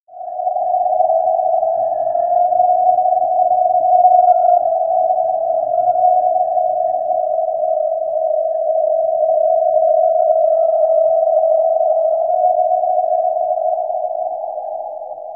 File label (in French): harp dyn pousse